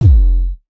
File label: power up; Little Big Runners